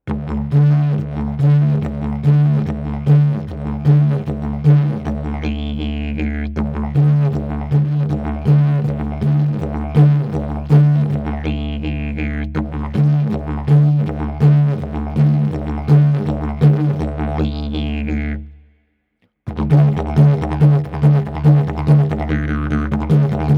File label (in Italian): ritmo livello avanzato